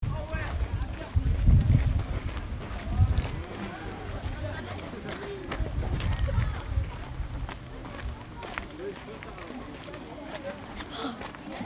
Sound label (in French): ambiance du parc des Chartreux